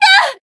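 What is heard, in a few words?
Voice damaged, Japanese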